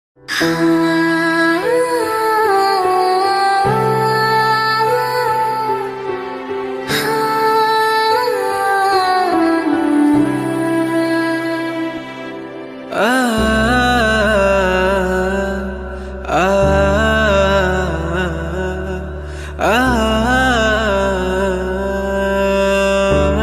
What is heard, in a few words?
bangla sad ringtone emotional ringtone